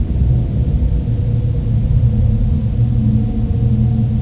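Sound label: cave ambience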